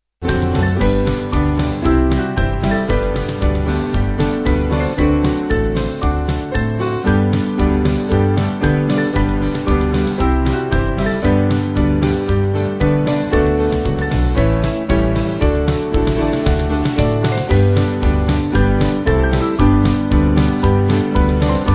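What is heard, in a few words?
Band, Free Music Backing Files for Churches; There Is Sunshine; Sweney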